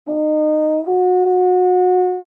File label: baritone